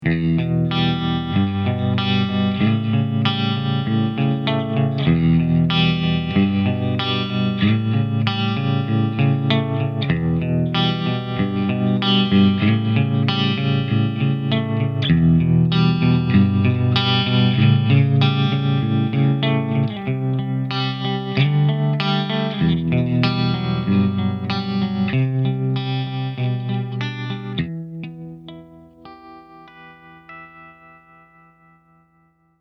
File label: Black 2x12 Tremolo